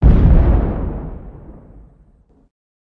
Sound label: mortar; czero; de kabul cz